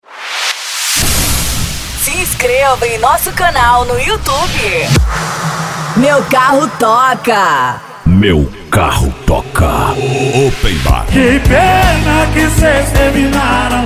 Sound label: Sertanejo; OpenBar Vol 40